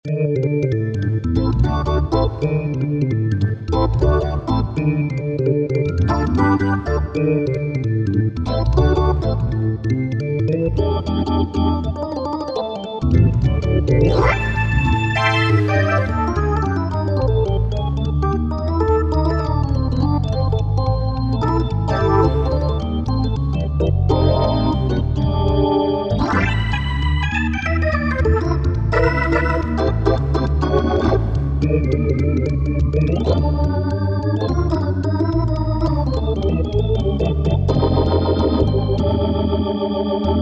Medium BX3, Korg TR-Rack

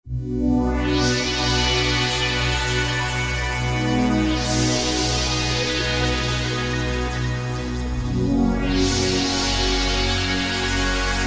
Wavestation Pad, Korg TR-Rack

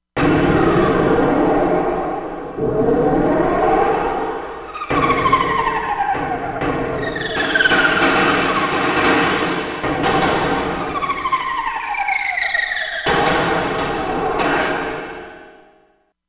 SFX, Korg MSC-16S; Environment

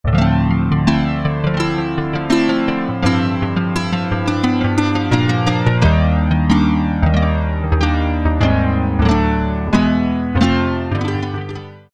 AccGuitar, Roland MKS-50; Factory examples

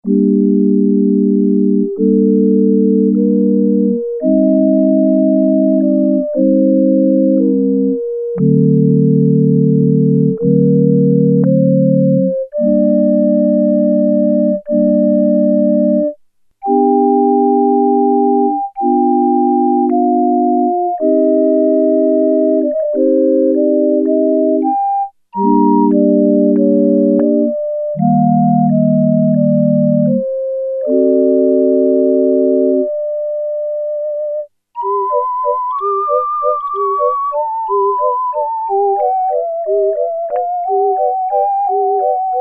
Pipe Organ Flutes; Sequential Circuits Prophet-5 (Ver.3); Factory Patch

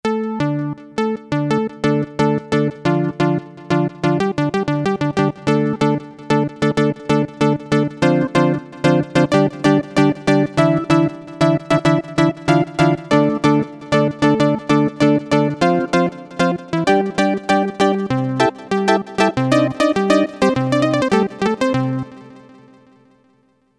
British Patch, Novation XioSynth 25

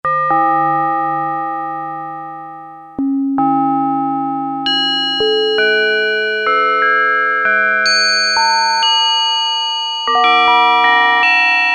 Resonance Bells, Prophet-5; Sequential Circuits Prophet-5 (Ver.3); Factory Patch

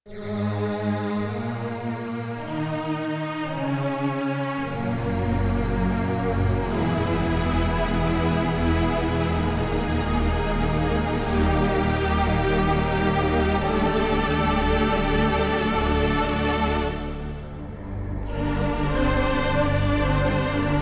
FairVoice III Stryng, Strings, Yamaha TX16W

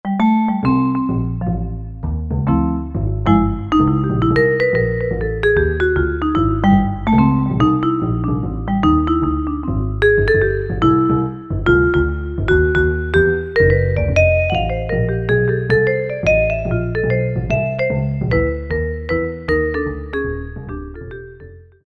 Wood Vibe, Korg MSC-1S; Korg MSC-1S Variety

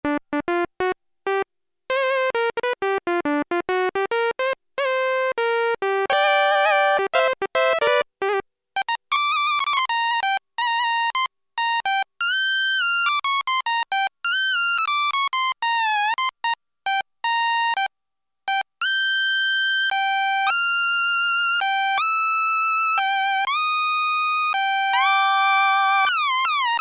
Lead III, Roland Juno-106; Factory Preset

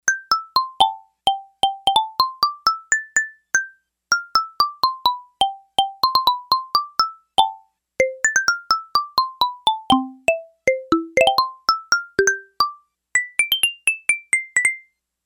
Xylophone, Yamaha DX11; Factory Preset Voices

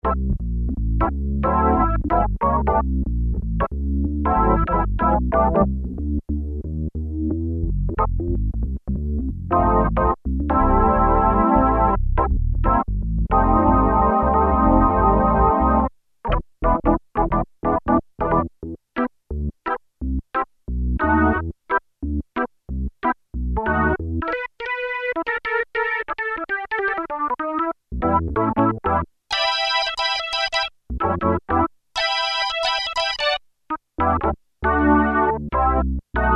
Organ I, Roland JX-3P

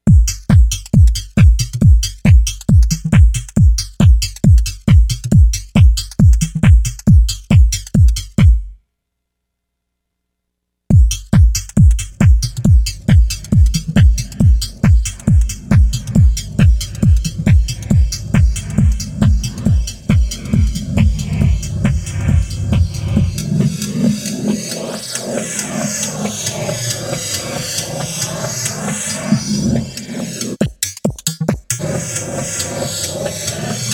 Ghost Flange, Lexicon MPX 1; Factory Programs

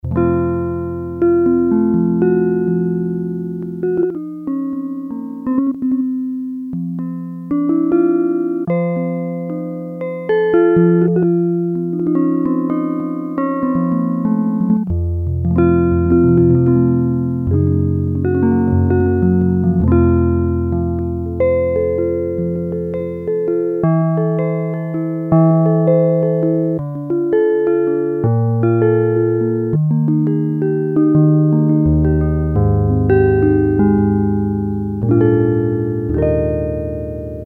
GUITAR 1, Yamaha DX7

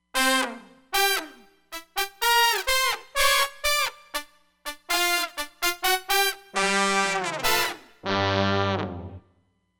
Brass Fall, Roland Fantom XR

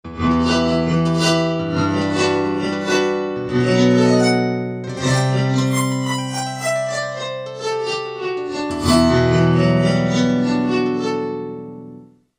Revrs Piano

